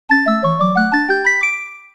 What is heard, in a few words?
Jingle Hoop Dance Next Round; wii fit u